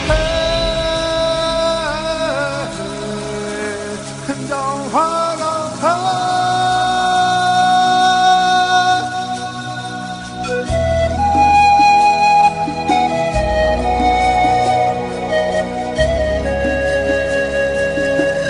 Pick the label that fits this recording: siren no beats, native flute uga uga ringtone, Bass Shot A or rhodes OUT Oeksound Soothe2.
native flute uga uga ringtone